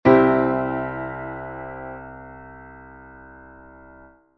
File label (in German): c dur akkord; wie schreibe ich einen song